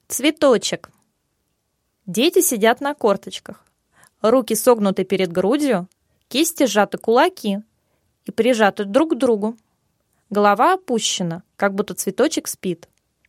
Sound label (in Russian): Ритмика для малышей